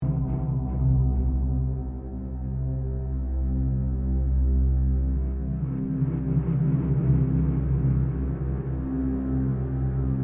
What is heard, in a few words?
Ambience; Saw II Flesh & Blood